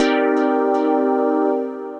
Chimera Stab, Synth Lead; Ableton; Factory Packs; Punch and Tilt

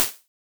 Move Aside, Ambience and Small Rooms; Ableton; Convolution Reverb

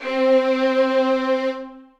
Violin Section Legato; Ableton; Orchestral Strings

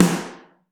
SN Mono, Snare

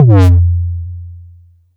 analog bass drop; analogBully; Ableton Foundation FreePacks; Wicked Kits

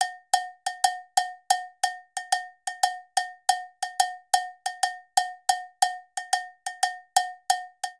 Afro Cuban Cowbell 120bpm; Latin Percussion; Ableton; Factory Packs

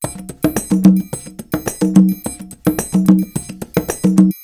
108 R&B Conga Triangle; R&B Funk Soul; Percussion; Conga Bongo

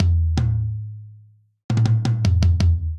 Energetic Pop Toms Only 160 bpm; 4 4; MIDI Clips; Ableton; Factory Packs; Drum Booth

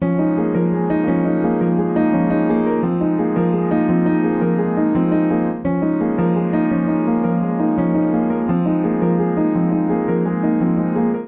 Ostinato C# Minor 85 bpm, Upright Piano; Spotlight; Ableton; Factory Packs; Upright Piano by Spitfire Audio

